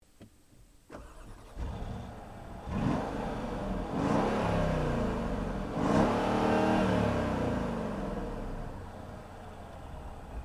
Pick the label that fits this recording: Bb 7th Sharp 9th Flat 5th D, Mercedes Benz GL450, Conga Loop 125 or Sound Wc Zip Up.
Mercedes Benz GL450